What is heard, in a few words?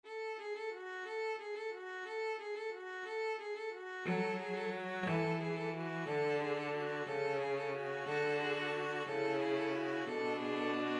string trio